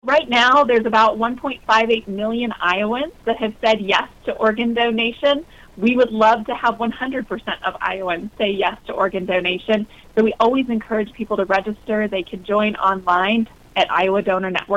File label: organ